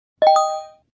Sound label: correct sound; ixl